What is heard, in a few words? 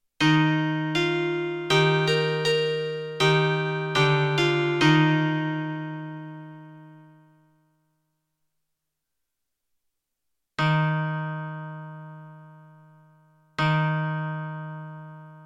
harps dulcimer